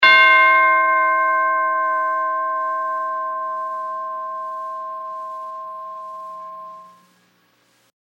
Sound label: Tubular Bell Single; Musical